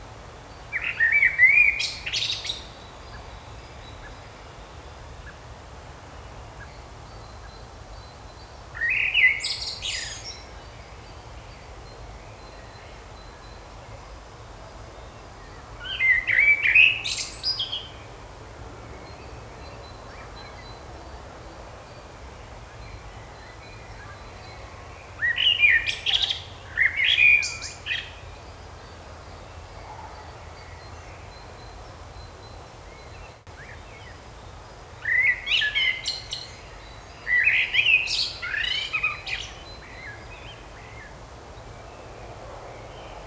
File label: Singing Birds in Park (Loop); افکت صدا حیوانات; Animals